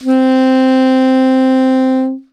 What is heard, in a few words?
saxophone soprano C octave 0